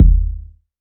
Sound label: BD, Waldorf Blofeld